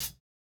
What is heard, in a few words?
hihat, house